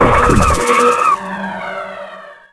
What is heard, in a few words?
dark pick; Shadow Man